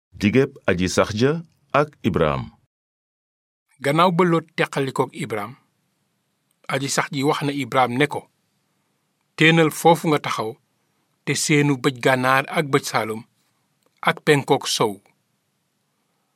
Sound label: Sax